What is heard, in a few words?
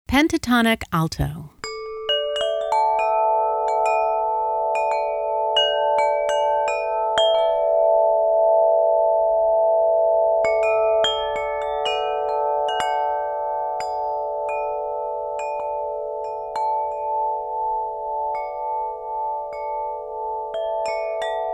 Pentatonic Alto